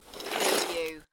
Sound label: Snow and Ice, Ice skate slide